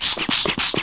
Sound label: airpump